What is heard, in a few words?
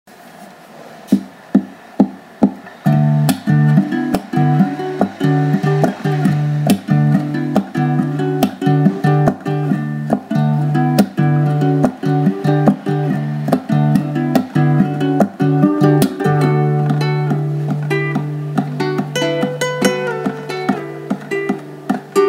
acoustic; Malaga